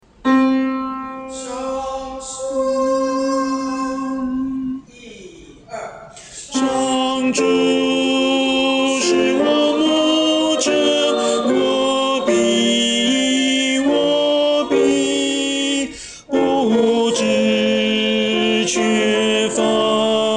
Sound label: tenor